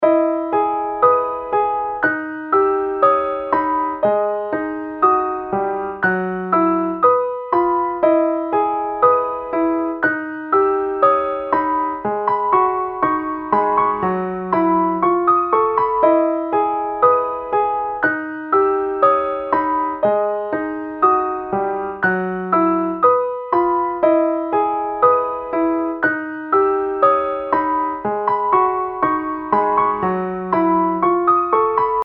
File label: piano